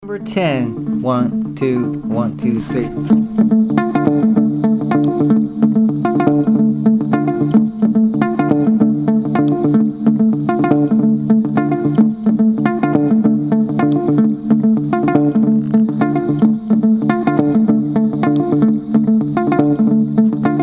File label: mozambique patterns